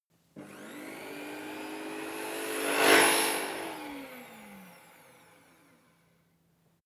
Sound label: fly by small motor medium